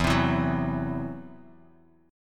E Suspended 2nd Sharp 5th E down Bass Standard; Esus2#5 strummed

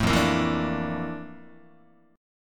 F Major 13th Guitar; strummed; JGuitar

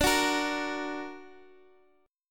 A sharp Augmented D

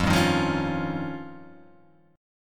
F 7th Suspended 2nd Sharp 5th

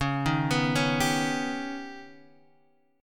D sharp Augmented 7th C sharp, guitar

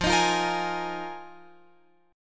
F Minor 11th Guitar Open F; strummed

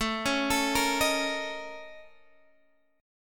Bb Minor Major 7th Double Flat 5th

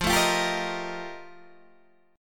F 11th, Guitar, DADGAD

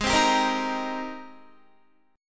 Ab Major 9th Ab down Guitar 4E 4A 5D 5G 5C 6F; AbM9 strummed; JGuitar

